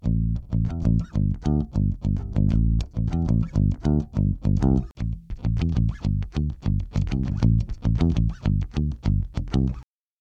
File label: Active w pick flat then enhanced